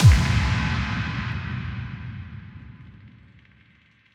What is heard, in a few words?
140 BPM Break FX Boom; Vengeance - Essential Clubsounds Vol.5; Catch a Plane Kit; A#min